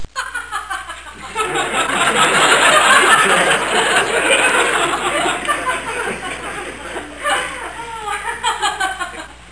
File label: Single Woman Laugh, Crowd